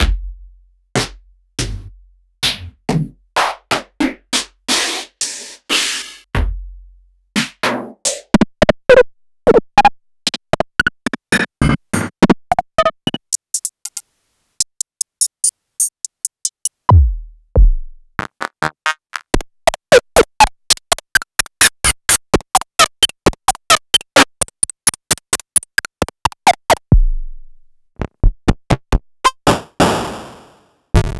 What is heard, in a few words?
Elektron Monomachine